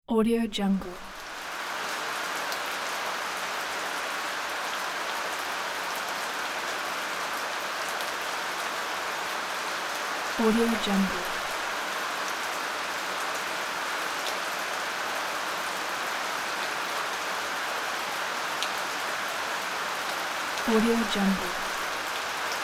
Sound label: soft rain